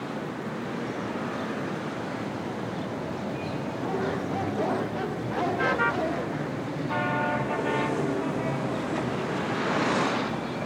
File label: SFX Times Square Amb; BopIt SFX TimesSquareAmb 2; Bop It! The Video Game